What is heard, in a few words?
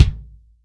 TIGHT KIK, TIGHT KICK